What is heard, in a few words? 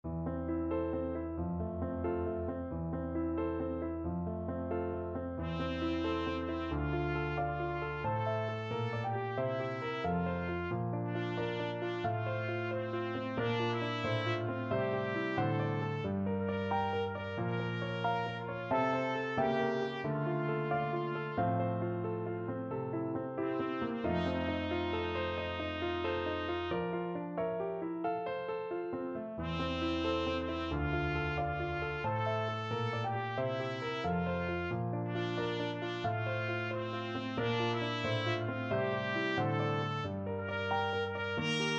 strauss op10 1 zueignung trumpet